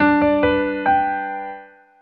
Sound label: Long; Samsung SM-W2019; notifications